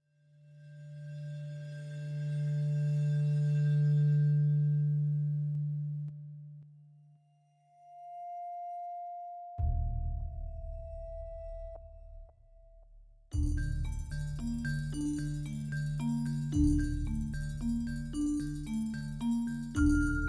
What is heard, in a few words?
vibraphone passage